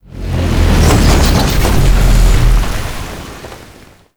Void Dragon Shake; Warframe; Duviri; Movement

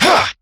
Melee Attack; New War; Erra

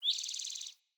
Bird Song; Pothia Bird Guide

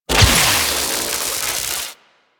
Electricity Shock Burst Fire; Oxium Osprey

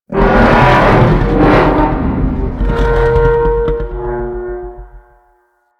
Tank Vocal; Lasrian Tank

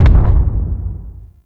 Arachnoid Camper Distant Step, Enemies; Warframe; Corpus

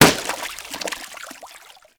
Water Balloon Impact

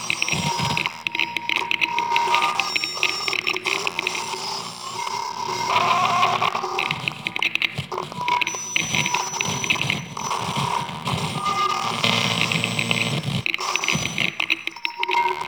Static Proximity Loop; Shadowgrapher; Warframe

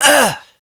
Generic Short Pain, Operator Voice; Operator Mission

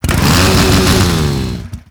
Saw Accent Rev; melee; weapons; Warframe; Grn Ghoul Saw Player; Kuva Ghoul Saw

